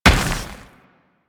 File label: Moa Combined Step Dirt, Footsteps; Enemies; Warframe